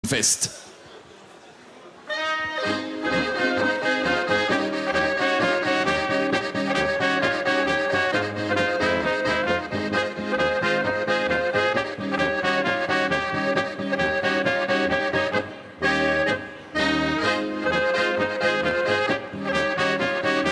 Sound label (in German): Trachtenfest